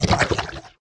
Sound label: zombie damage, monster